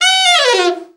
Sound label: BRA ALT FALL, SAX; Best Service Gigapack 1